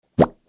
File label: wap